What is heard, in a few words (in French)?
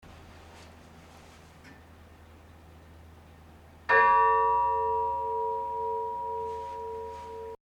cloche; Antignac